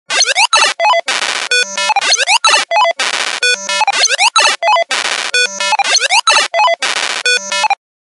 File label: 8bit; RingTones